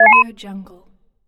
confirmed; wildemotion

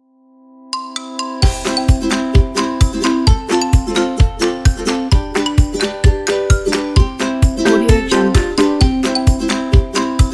funny children

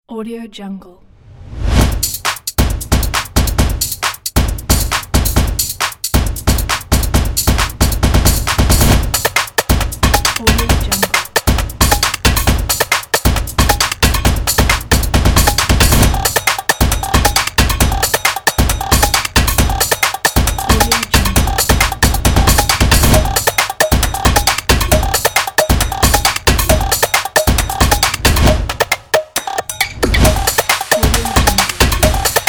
upbeat percussion

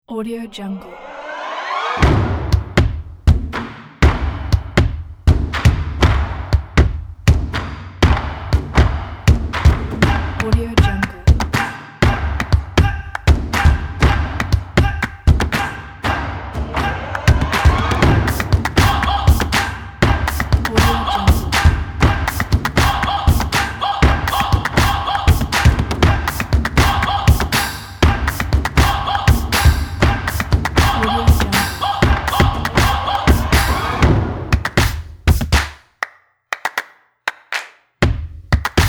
tribal sport percussions

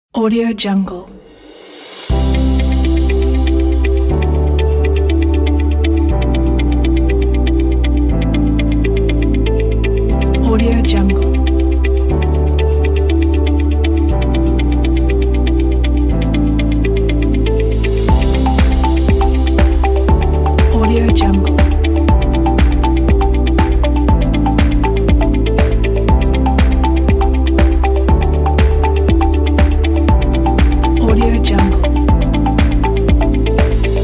inspiring corporate upbeat

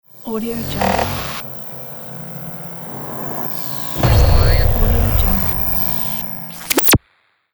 glitch hit

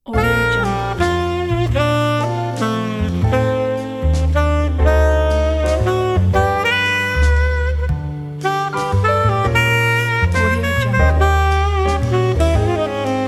fresh calm jazz

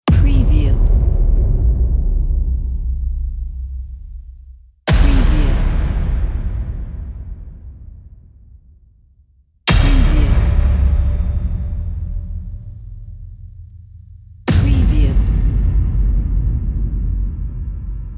epic hit

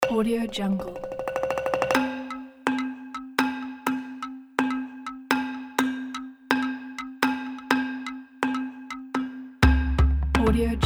african music background

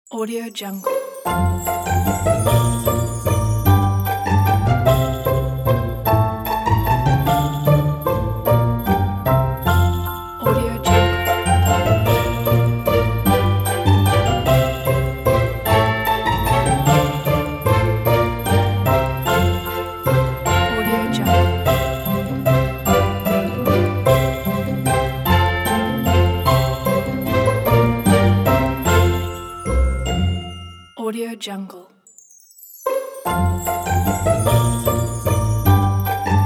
we wish you a merry christmas

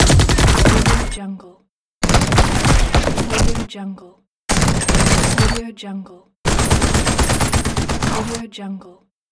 machine gun

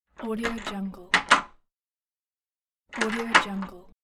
mechanical lock click

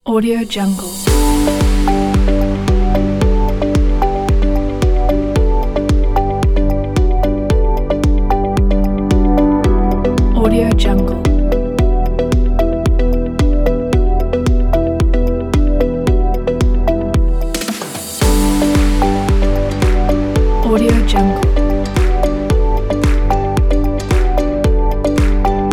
digital information presentation

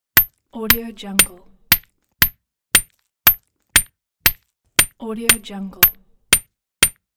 hammering on stone

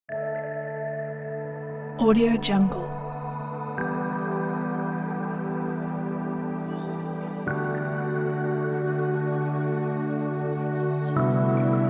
electronic ambient dreamy pack

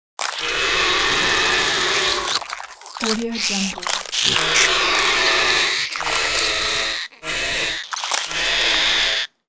creature mix big spider attack vocal footsteps

